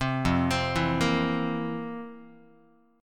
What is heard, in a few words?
F Major 7th Suspended 4th, Guitar; arpeggiated